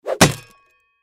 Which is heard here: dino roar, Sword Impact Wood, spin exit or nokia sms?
Sword Impact Wood